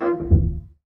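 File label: Ped Noise Etc Single Push, Piano; Piano Miscellaneous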